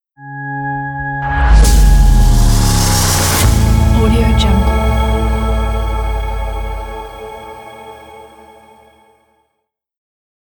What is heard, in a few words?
electronic riser logo